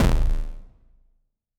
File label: Crushed Kick